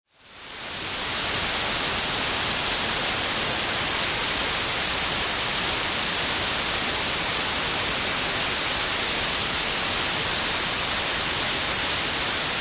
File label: white noise deep space edition